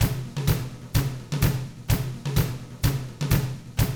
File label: 127 Drums